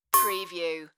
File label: Clink